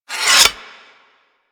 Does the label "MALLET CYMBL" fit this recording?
No